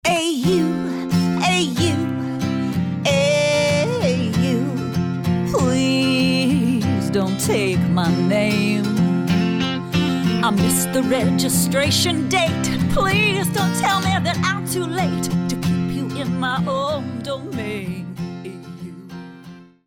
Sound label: Singing